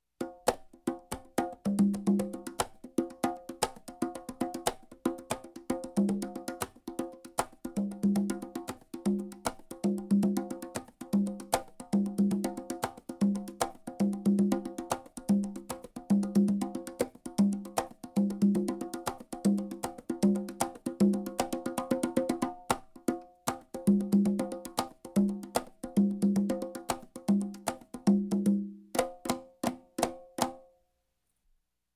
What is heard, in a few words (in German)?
Suedsee Schneller Conga Rhytmus